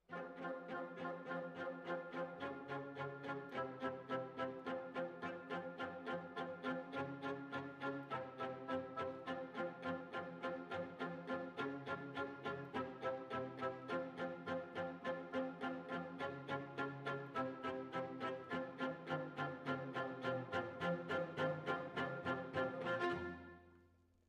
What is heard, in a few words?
Violas, jazz for piano